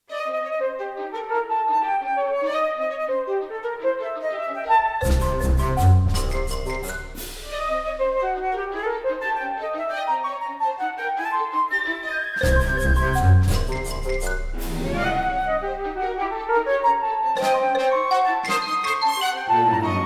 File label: Flute Scherzo; M.Drost